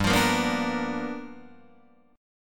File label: G 7th Sharp 9th G chord, Guitar Open D